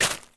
Step Ice

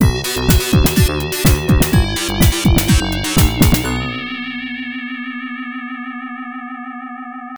Level Clear; The Ottifants; Genesis

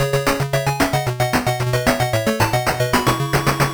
Round Clear; Shinobi; Game Gear

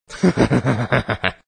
Laughs; Sonic.EXE

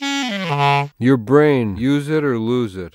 Quest Failed; Garfield's Diner; Android game music

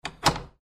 window latch control; the 39 steps

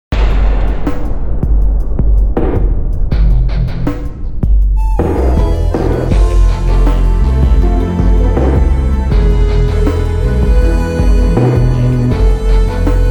italian splash violin; Gangland

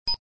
Coin (Small); Super Mario 63

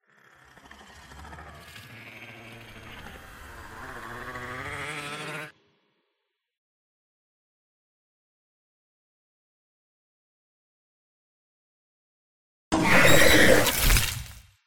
Growl; SFX; Huggy; Huggy Placeholder

